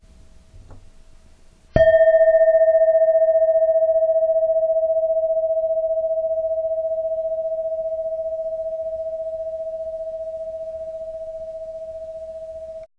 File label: washed mani e, bowls